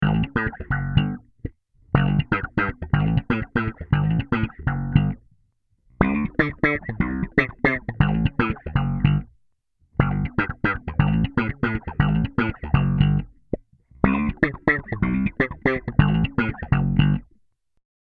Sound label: updown slap